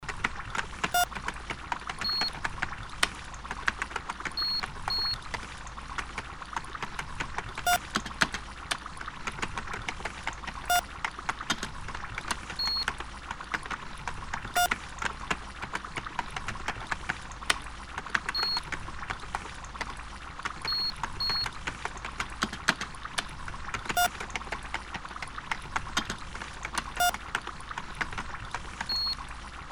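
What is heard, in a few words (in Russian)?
Лаборатория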